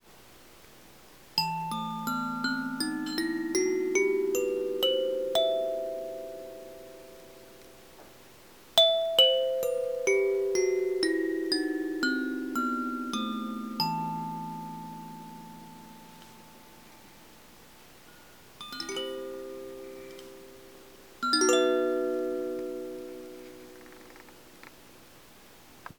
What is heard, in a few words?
11 note kalimba instrument kalimbas mbira Shiraz